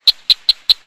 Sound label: Frog Cricket Chirps